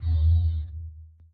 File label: Panel Slide; bejeweled 2 deluxe